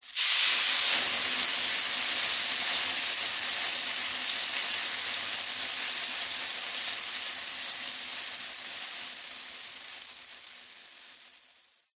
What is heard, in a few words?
nature water hit hot metal sizzle bubble